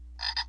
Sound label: frog; FoodChain.activity